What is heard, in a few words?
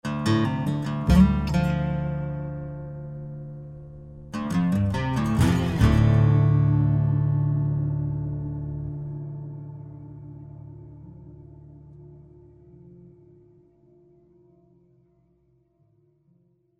Music